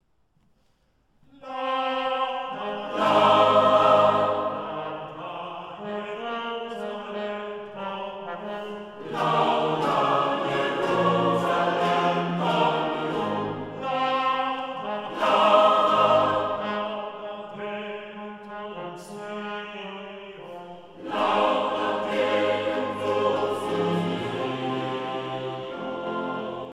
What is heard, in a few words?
Psalm 147 Lauda Jerusalem